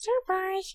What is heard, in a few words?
Surprise